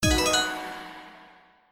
Symbol Regular Win; slots; Wealth Gods2

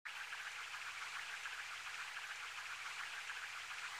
SFX Spin; slots; Wealth Gods

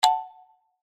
btn Standard select Spin Number; slots; Pirate Quest

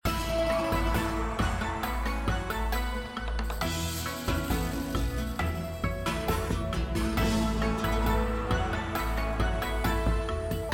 BGM; Match For Cash; Lucky Wok

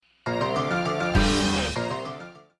Big Win; slots; Robot Vs Monster

